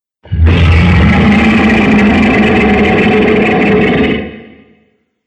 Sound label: Demon Of Distortion, Monster Sound Effects; horror